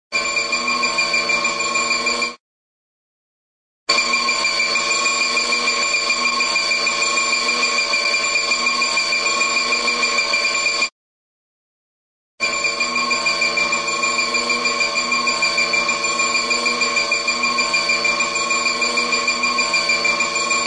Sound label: sci fi freeze gun, short; cartoon